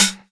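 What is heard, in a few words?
hi-hat percussion, Dance Mega Drums; Best Service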